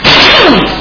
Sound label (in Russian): gaechnii klyuch rezkii odinochnii shumnii